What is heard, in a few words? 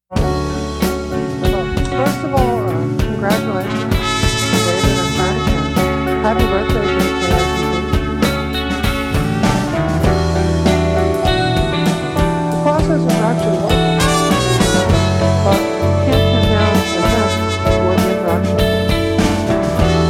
Rock and Roll